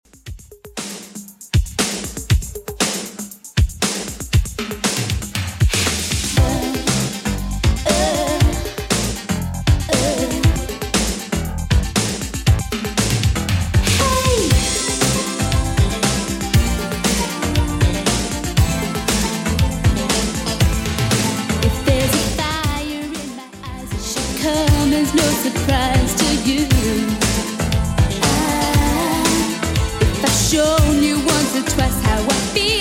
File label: 80s Redrum 118bpm